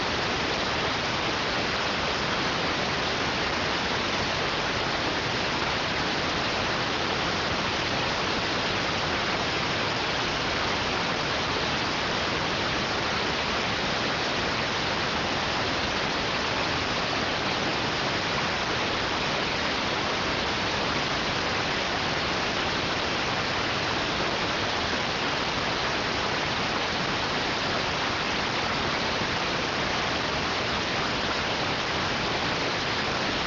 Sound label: rtty rx